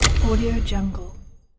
magical chest opening fx

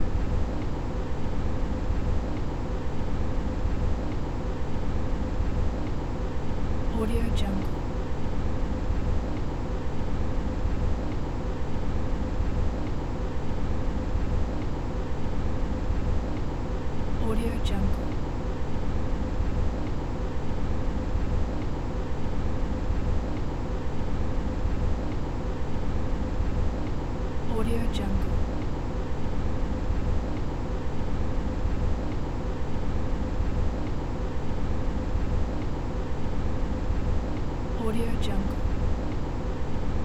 empty room ambience

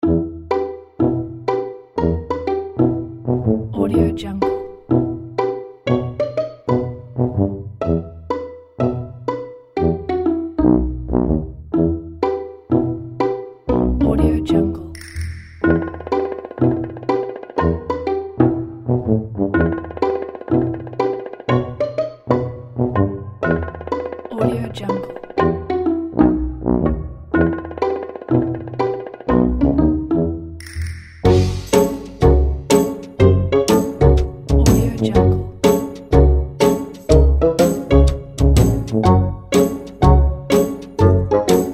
comical funny pizzicato comedy music

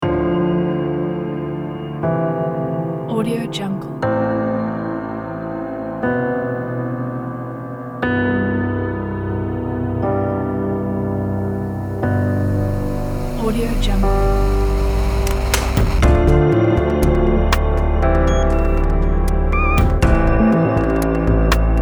atmospheric piano

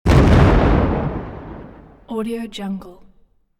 explosion monster no debris; hollywoodedge

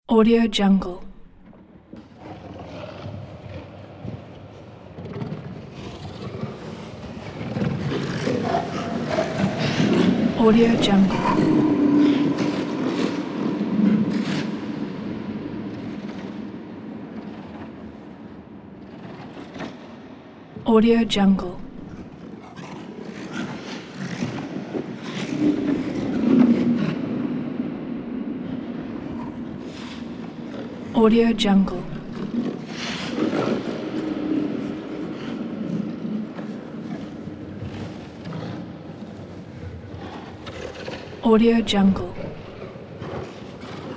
horror ambience

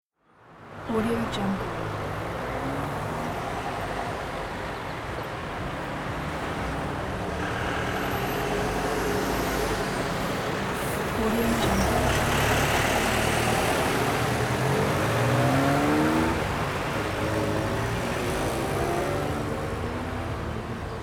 city car traffic ambience; holy records

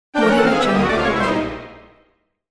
cartoon orchestral failed or falling down; kuksaudio

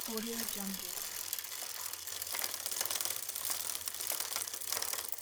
bicycle gears

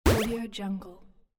bonus retro game

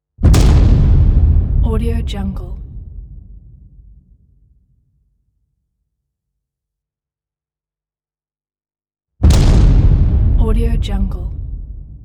cinematic metal hit; capeaudio

